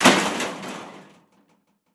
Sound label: Crash Metal With Rocking; crashes; AdobeCC